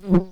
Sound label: bumblebees, Hypnorum workers